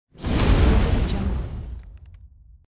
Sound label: fire explosion